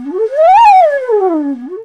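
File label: bansuri; World Combos; BONSURI; E-MU Producer Series Vol. 7 – Old World Instruments; World Winds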